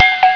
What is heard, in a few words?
cowbell